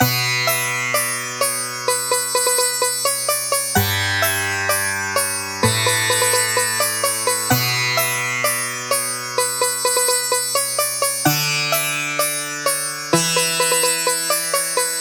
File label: Snake Sitar Charmer